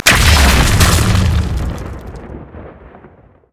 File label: spider smash; LoL